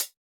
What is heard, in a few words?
Analog Hi-Hat, Electronic Drum Samples; E-Punk Project Drum Samples